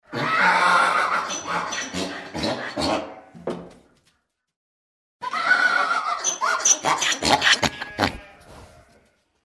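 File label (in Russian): osel golos, donkey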